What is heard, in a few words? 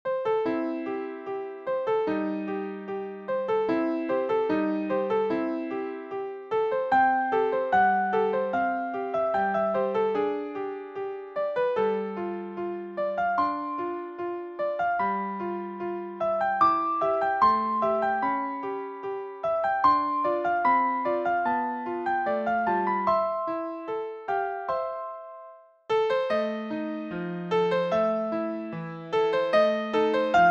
Music Box